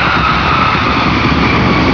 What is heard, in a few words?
JETLINER; TRANSPOR